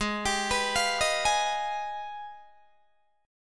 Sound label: G sharp Minor Major 13th, Guitar DADGAD; arpeggiated; G#mM13; 18,22,21,22,18,18